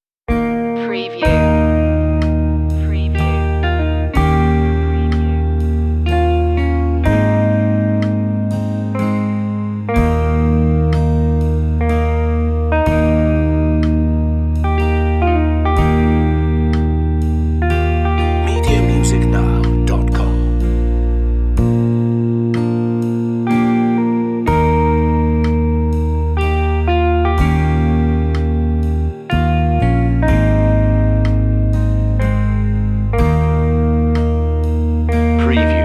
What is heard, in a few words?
amazing grace vslow guitar verse 62bpm; memorial